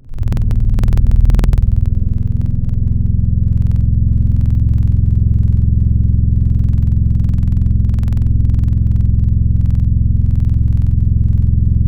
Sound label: Reggae Trump Long, Wavetables; Synthetic's Serum Collection; Standalone-Music SYMPHONY Wavetables